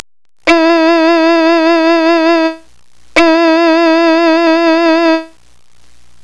Vibrato and tremolo, in sync (1), and out of sync (2).